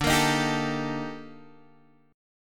D sharp Major Sharp 11th, D

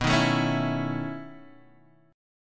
B 11th B Guitar DADGAD, chord

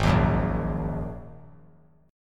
G sharp 7th Suspended 2nd; G#7sus2 strummed; 8,10,9,8; JGuitar

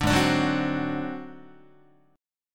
G sharp 7th Flat 5th G sharp down Guitar, chord; G#7b5; strummed; JGuitar

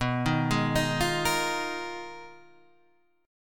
Ab Minor 6th Add 9th, B, guitar chord

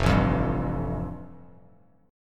F Major Add 9th; Fadd9 strummed; JGuitar

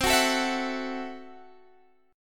C 6th Flat 5th C

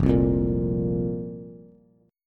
F Suspended 4th F, Bass; Fsus4 strummed; 1,3,3,3; JGuitar

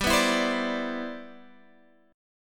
G 6th

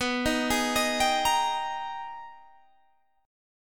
Ab Minor Major 9th

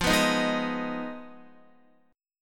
F Minor 7th Flat 5th, Guitar; strummed; JGuitar

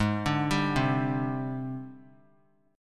G sharp Major 7th Flat 5th; 20,21,22,22; JGuitar

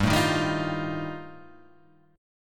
G 7th Suspended 2nd Sharp 5th G chord

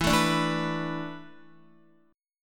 E 7th Suspended 4th, Guitar

